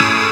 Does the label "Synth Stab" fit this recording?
Yes